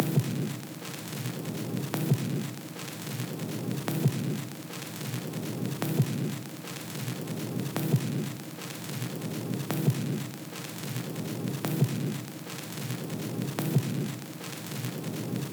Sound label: Vinyl Noise; Cymatics; Eternity Sample Pack